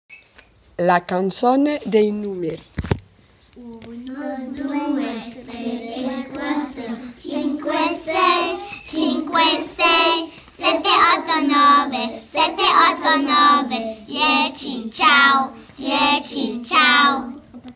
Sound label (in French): la chanson des chiffes